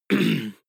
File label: harrumph male single short; soundpool